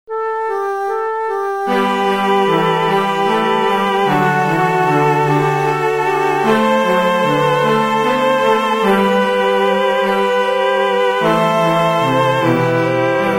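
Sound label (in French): concerto pour flûte et orchestre cordes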